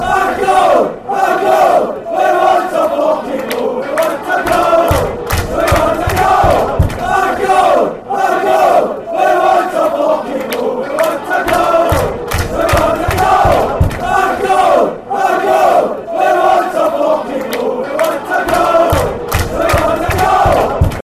a goal, fan chants

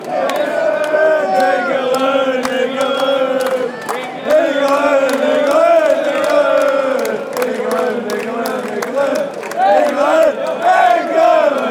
engerland chant, fanchants

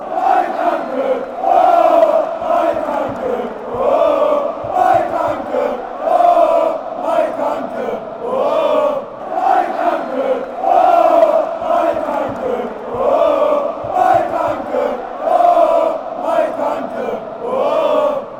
chant; b moenchengladbach

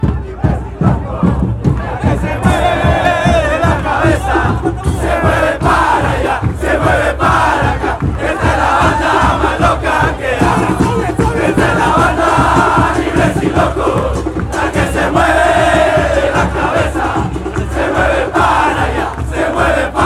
chants

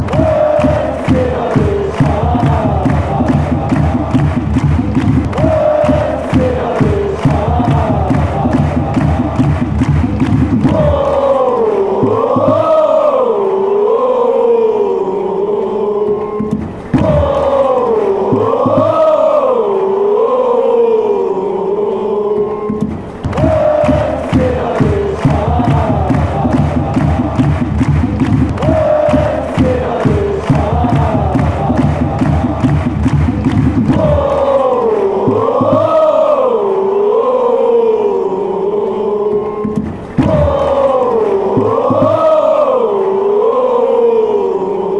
woah shalalala, fan chants

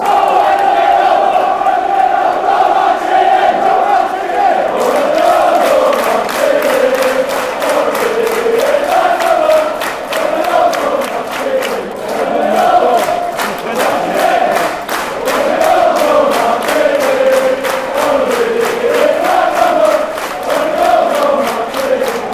when hull goes marching, fan chants

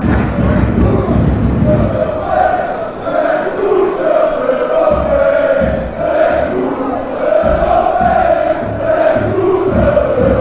chant, fanchants; psv

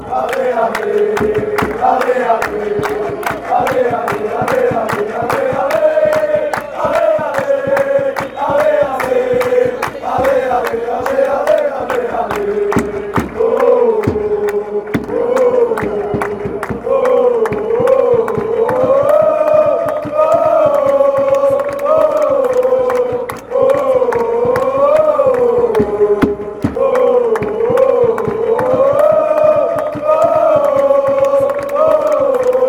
allez oh oh, Braga football chants

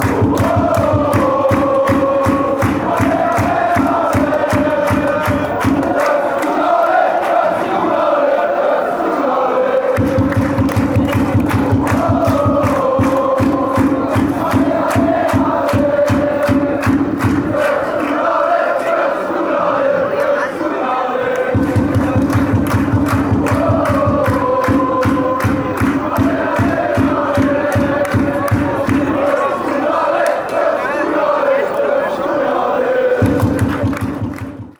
woah ale, fan chants; fc thun

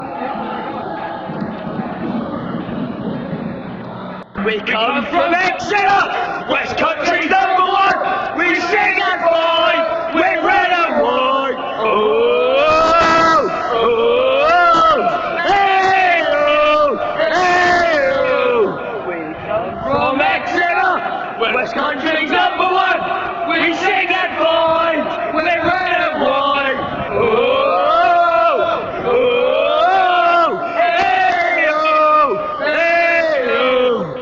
west countrys number 1, fan chants

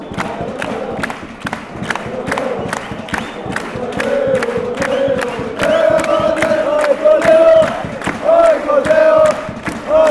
aek athens chant

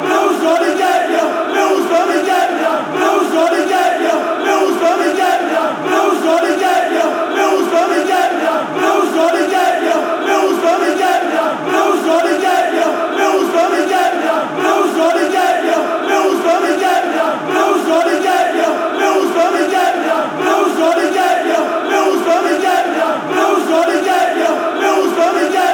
gonna get ya, chants; millwalls

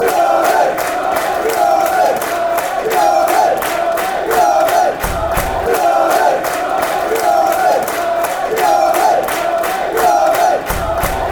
green army green army ytfc fanchants

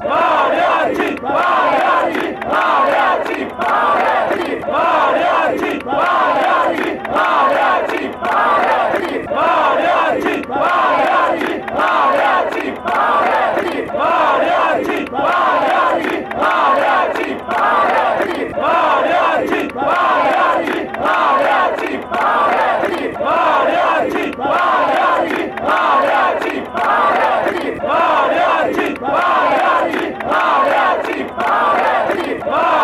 mariachi, fan chants

